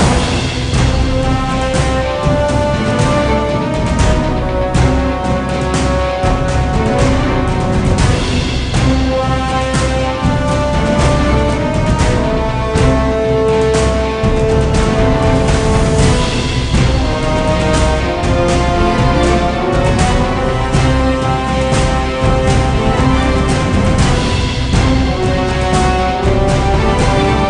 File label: Track Music Action